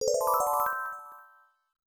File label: 1-Up; Bomber Through; GoGo Jump Hero Gaiden 2; IBM PC/AT